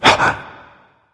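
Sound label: nonverbal breath; smite; voicepack; ullr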